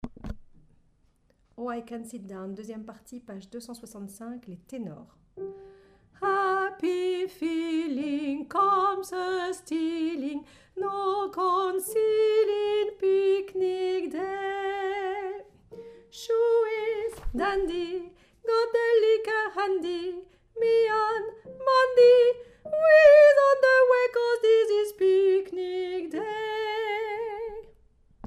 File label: Tenor